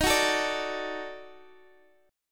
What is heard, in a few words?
Eb Minor Major 7th Flat 5th Eb down Guitar Half Step Down, chord; EbmM7b5